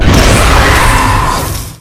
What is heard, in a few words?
vaporizer; garrysmod